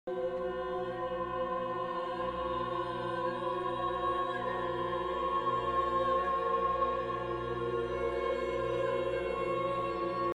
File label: Clocks And Clouds; Ligeti